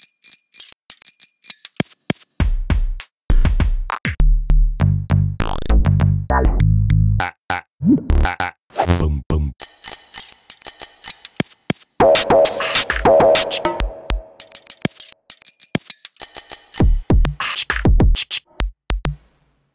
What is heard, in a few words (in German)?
Fahrrad 100 BPM; St. Pauli